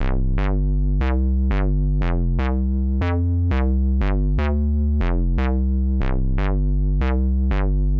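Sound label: square bass C, Nu Jazz House, Bass Loops, 120 bpm; PowerFX - Nu Jazz House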